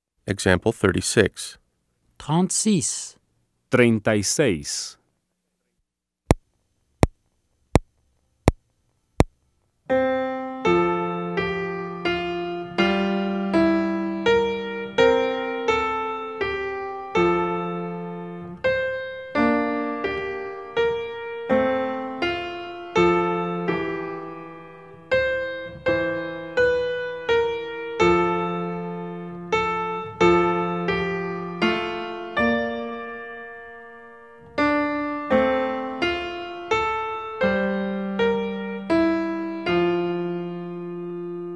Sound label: Keyboard